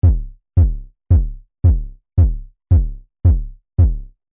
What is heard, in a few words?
Kick Roland VCO Sys 500 Filter